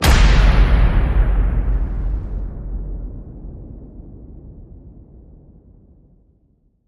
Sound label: hit big; Splice